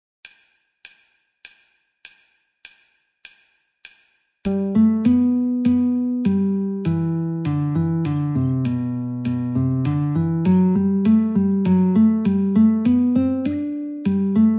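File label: Guitar metronome 50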